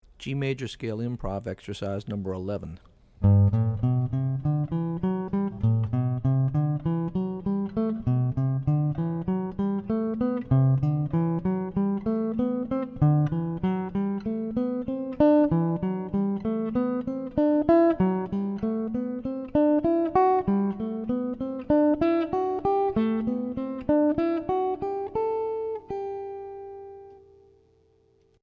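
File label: G Major Scale Improv Exercises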